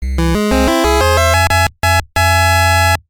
Game Over; Mach Rider; NES

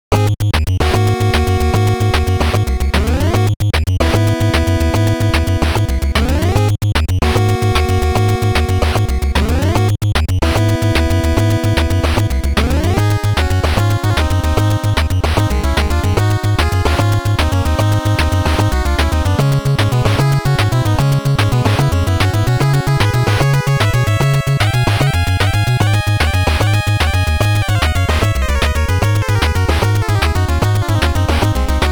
BGM; World Grand Prix Pole to Finish

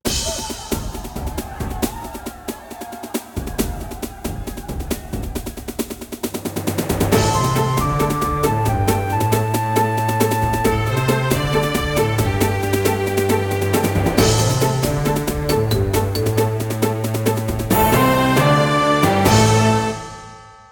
Win; Age of Empires Expansion

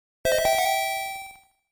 Jingle; Ms. Pac-Man (Tengen); NES; Junior Jingle

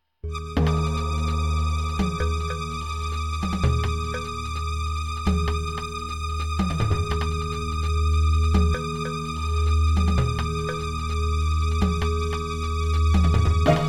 Suspense; Fade to Black; DOS; Alcatraz Suspense

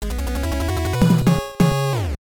Stage Clear, NES; Power Blade II

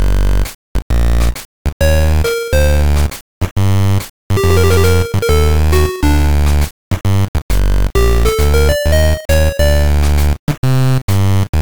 Jazz, GB; Zoop

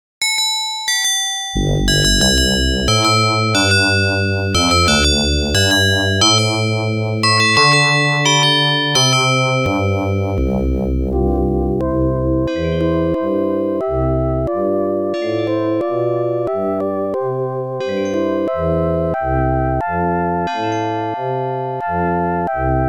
Sound Blaster Auld Lang Syne; DOS

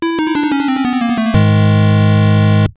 Death; T&C Surf Designs; NES

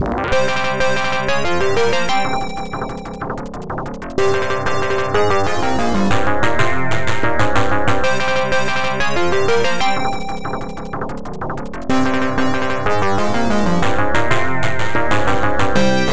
Mission Clear; DragonStrike; PC98

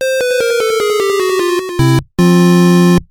Game Over, NES; T&C Surf Designs

